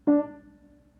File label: (chords) d; DesertTrek